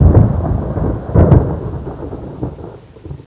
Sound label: multi thunder